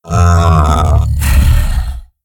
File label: pachyrhino idle